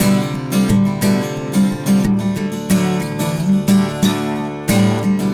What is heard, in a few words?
Acoustic Guitar; Guitars